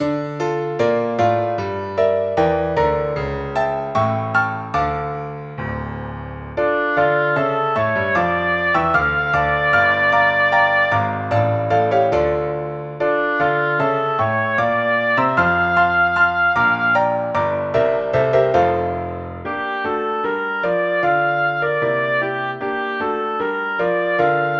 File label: for oboe; synth